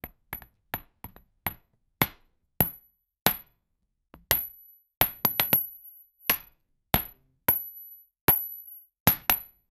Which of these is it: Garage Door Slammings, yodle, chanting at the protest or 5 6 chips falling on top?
5 6 chips falling on top